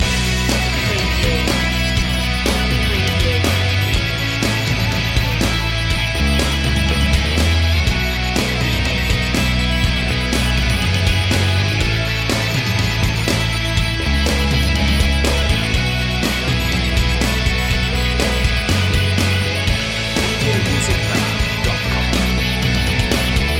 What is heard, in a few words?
flying solo loop